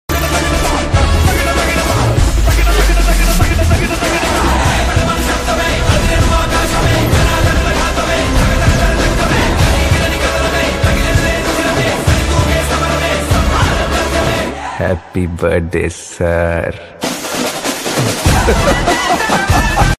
trailer bgm ringtone; saripodhaa sanivaaram; sj suryah; priyanka mohan

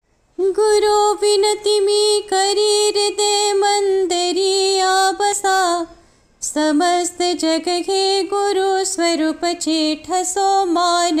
devotional ringtone; Sai Baba; kakad aarti

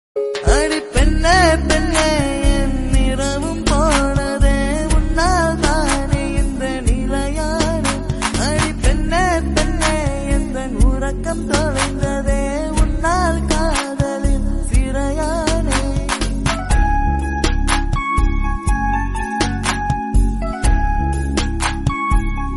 telugu ringtone south ringtones

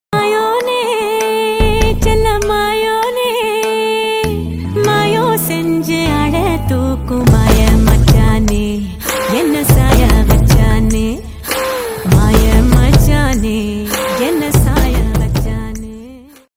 tamil love song; tamil movie

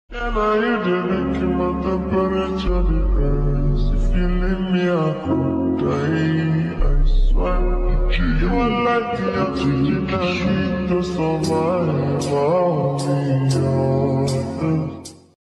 slowed speed up

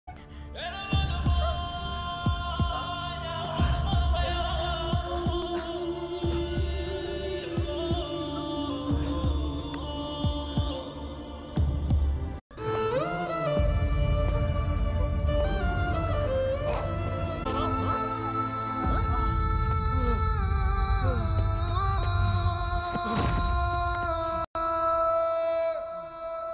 sad bgm sad ringtone

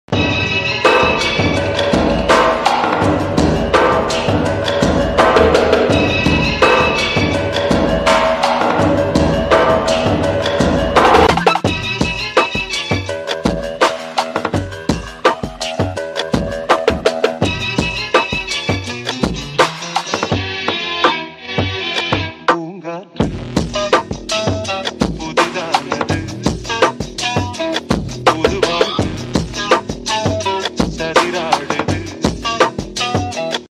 remix bgm

